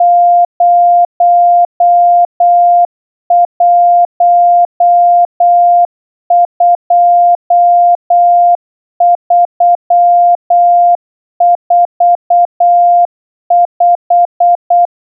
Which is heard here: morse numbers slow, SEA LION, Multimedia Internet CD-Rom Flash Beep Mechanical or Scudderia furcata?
morse numbers slow